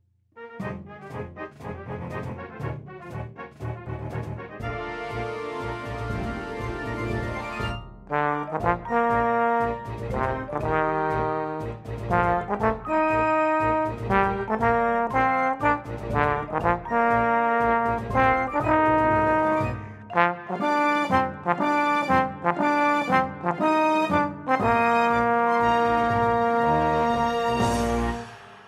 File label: trombone